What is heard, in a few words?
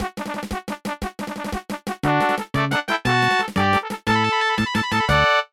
Race Victory; Super Solvers Gizmos & Gadgets